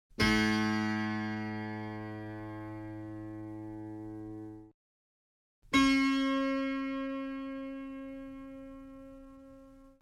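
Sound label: harpsi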